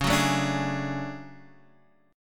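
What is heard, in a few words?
D Major Sharp 11th D flat, chord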